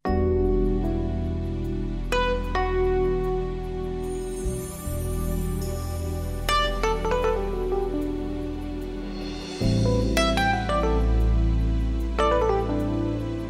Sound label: Love waltz; Wind from the sea